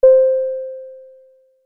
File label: Announcement (1-tone) - Ding